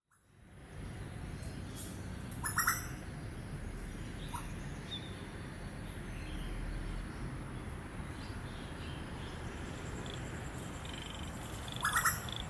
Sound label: Birds Chirping